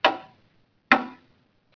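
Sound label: clock tics; Microsoft Graphics Studio; Home Publishing 99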